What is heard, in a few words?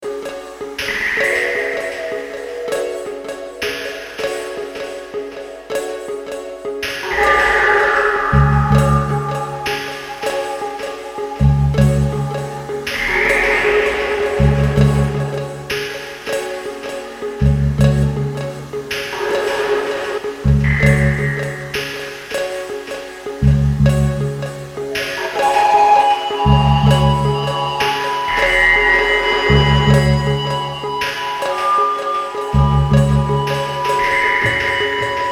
AMB. GUIT